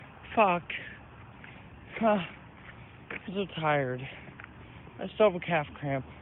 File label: calf cramp